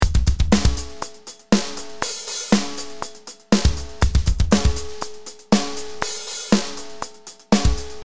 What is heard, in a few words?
POP Drum 120